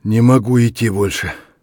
tired, voice; Escape from Tarkov

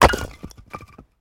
building fall small

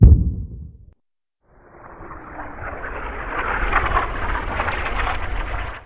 Artillery; Red Orchestra Ostfront 41-45

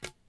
Staff Tap; Civ4 warlords

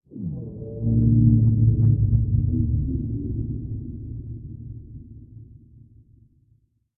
ambient port; World Of Warships; port3d

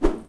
Swing Cable; Civ4 warlords

